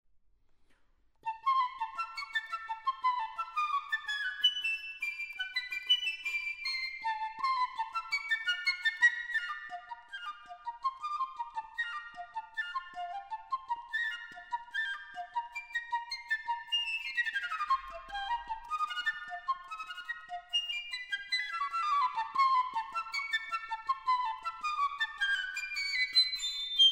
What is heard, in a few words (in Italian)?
Vivace molto ritmico